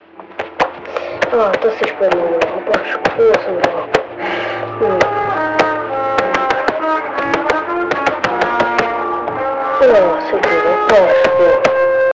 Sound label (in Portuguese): trompete